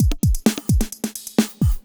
electro kit 130

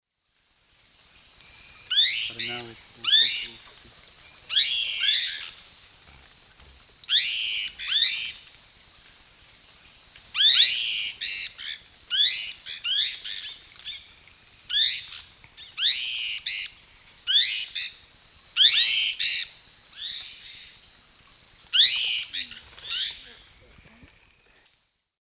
Philohydor lictor, group calls; S. America; Eastern Lowlands

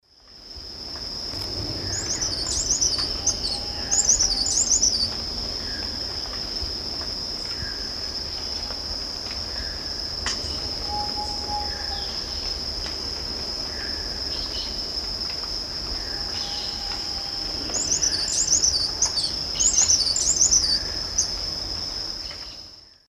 Phaethornis bourcieri, song; S. America; Eastern Lowlands

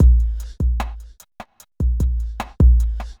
hiphop

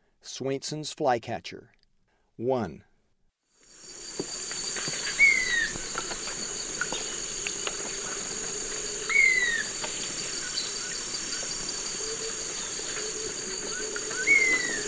Swainson's Flycatcher; S. America; Eastern Lowlands

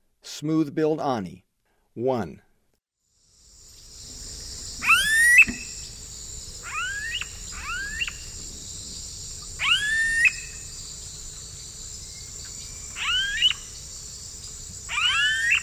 Smooth-billed Ani; S. America; Eastern Lowlands

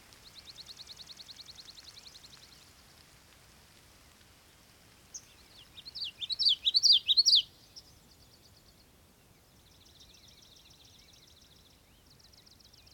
Marsh, Morning Songbirds; Echoes of Nature - Morning Songbirds

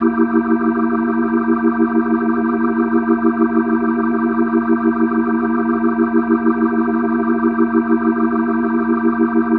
Organ C7, Hip-Hop Organ Licks

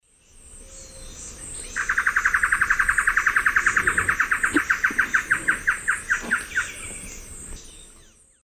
Taraba major, call; S. America; Eastern Lowlands

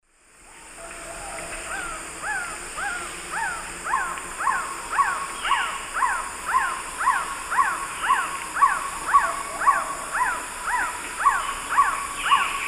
Trogon melanurus, song; S. America; Eastern Lowlands

